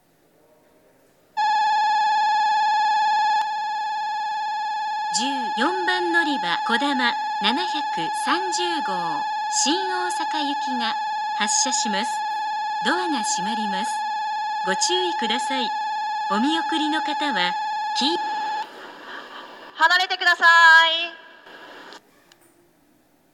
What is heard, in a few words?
kodama shin-osaka; こだま730号; 新大阪; こだま730号 新大阪行です。; hakata; station; jr-west